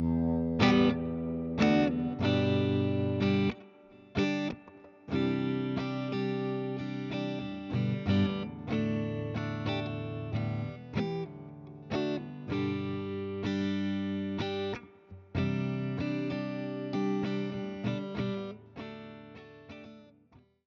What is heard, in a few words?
Les Paul Shure SM57